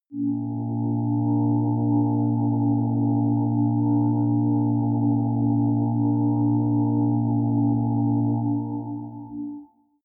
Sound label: floating ufo